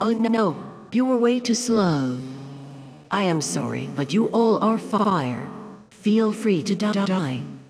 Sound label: game over; svencoop; ragemap2022; trempler